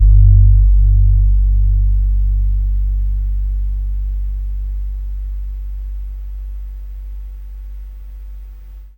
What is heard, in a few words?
GONG BESAR G2; Spectrasonics; Heart of Asia